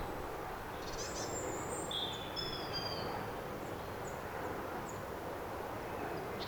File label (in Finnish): punarinnalta pari todella erikoista ääntä jos ovat matkintoja niin minkä lajin; Hiljaista ja vähän oli tihkusateista.